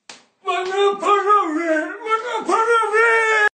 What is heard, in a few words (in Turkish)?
ses efekti; bana para ver